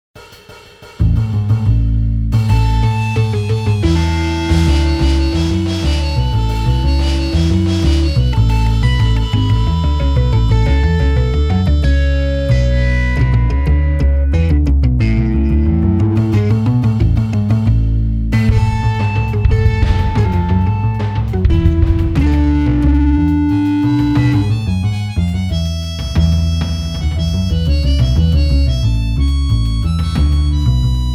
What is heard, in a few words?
Polska